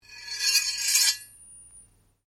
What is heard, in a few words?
Blade Lock Scrape, Sword